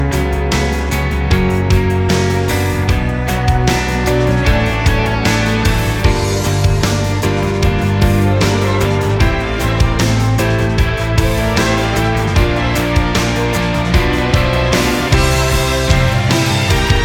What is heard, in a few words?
Instrumental